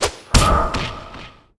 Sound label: Chop; Puzzle Chronicles; PS3; PSP; X360; SFX SPELLS